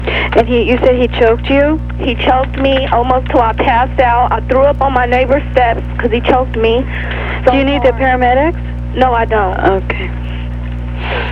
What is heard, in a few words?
Vomiting, 911 calls; Course Curriculum